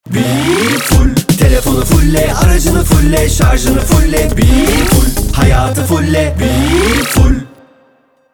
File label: Jingle; beefull